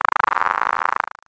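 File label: dice roll; My Science Fair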